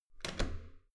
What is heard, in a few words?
metal door heavy handle down